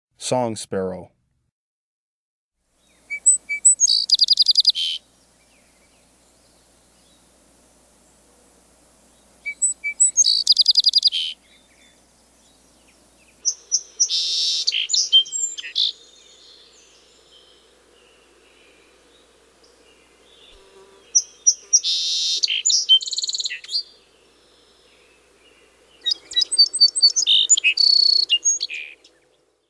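Song Sparrow, Breeding Birds of the Chicago Region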